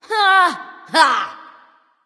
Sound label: "ugh"; smite; nike